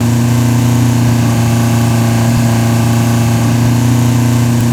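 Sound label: cycle constant, Engines; E-MU Producer Series Vol. 3 – Hollywood Sound Effects; Ambient Sounds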